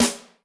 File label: Snare, Yamaha RY20